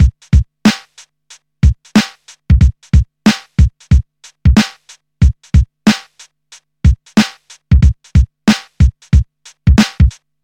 92 bpm drum loop c sharp key, kick tuned to the C# note